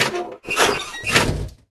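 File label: Dark Army Melting Furnace Attack Preload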